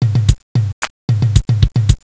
Beat

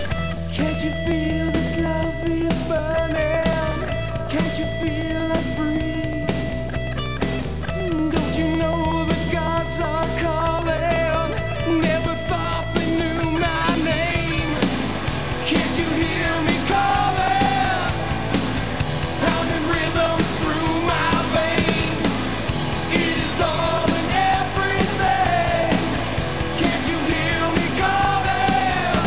calling

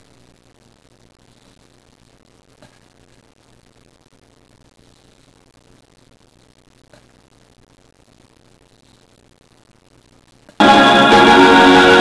brega

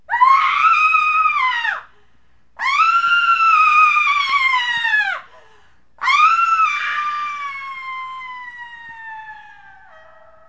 scream

